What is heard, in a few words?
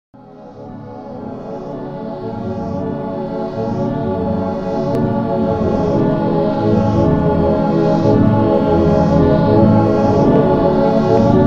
music hideout search 155bpm; in-game music; searching queueing music; Silent Hill 2